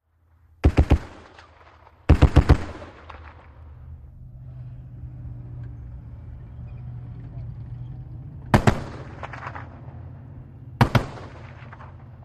Apc: Exterior Driving And Firing. 50 Caliber Machine Gun. Clean Distant Shots At Talk. Stereo; armored personnel carrier